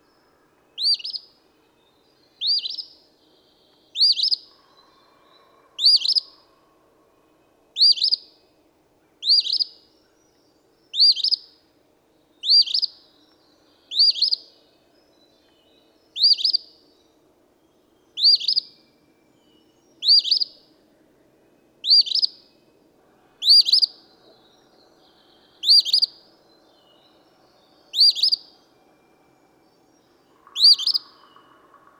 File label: Eastern Phoebe